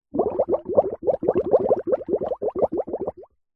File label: nature water bubbles bubbling in foamy soapy water